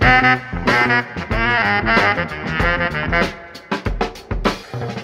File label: sax swanky, Loops; Phonky